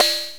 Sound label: Blipp, Drum Machines